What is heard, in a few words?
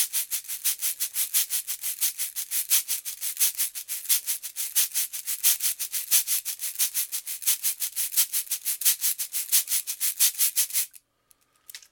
MC930 cabasa